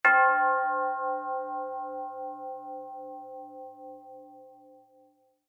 mission bell

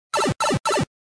Going down a pipe; Super Mario Bros.

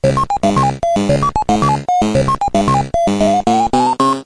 Pacman Introduction Music

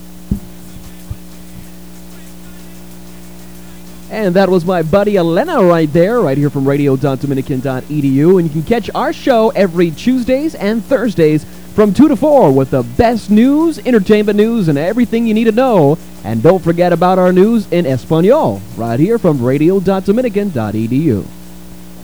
old vocals

